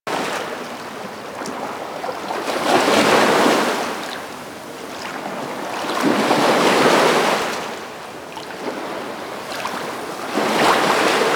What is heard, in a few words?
Water Laps Ocean Loop; Ambience; Props; Loops; Eidolon; Warframe; Eidolon Plains